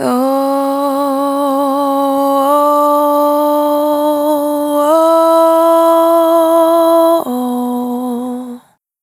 Single Voice Melodic Loops; Pocket Diva World Class Vocal Samples

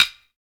Sticks; SB Sticks

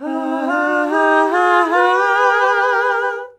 HAHAHAHAA, MALES; Best Service; Hyper Dance

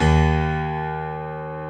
C#1 STR PIA, G.PIANO; AKAI S-Series CD-ROM Sound Library VOL-7

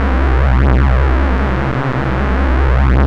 PULSE C2; Best Service Alpha Dance vol.2

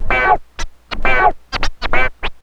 TURNTABLES, Hip Hop

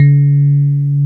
KEY EP1 SFT, MKS20 + EP; Roland L-CD701; Pop Pianos 3

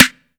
EFEX SD, FX DRUM

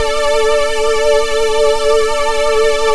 SWING D3, LEAD SYNTH; Best Service Alpha Dance vol.2

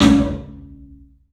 CLUB SN VRB, 3D Drums; 3D Sound

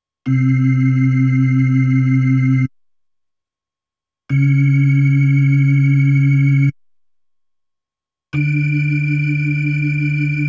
B3 Organ; Masterbits CLIMAX 8

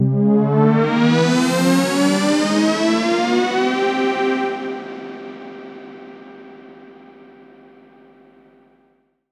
Virus Tone Drop (C2)

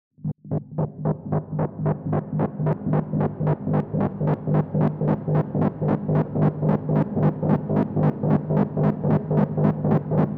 reason syn; Synths and FX; neuro; Sinistarr

